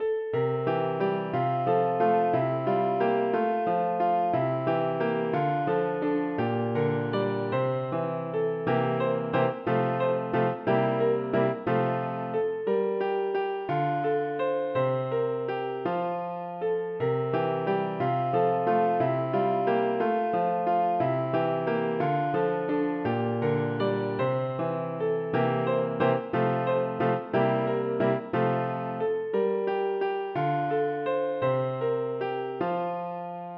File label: piano